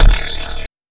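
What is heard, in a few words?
HH, hi-hat; Sonic Foundry (Sony Creative Software); Esoterik Beatz; Quesy Kit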